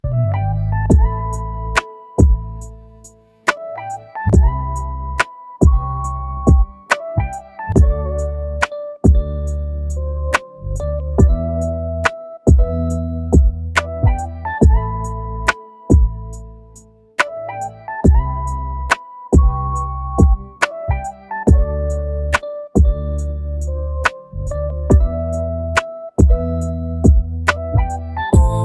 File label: rainy lofi